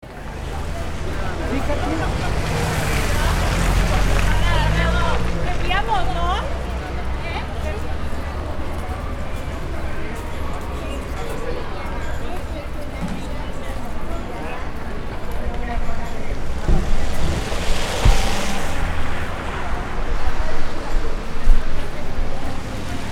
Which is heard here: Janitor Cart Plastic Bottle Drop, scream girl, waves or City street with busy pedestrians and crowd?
City street with busy pedestrians and crowd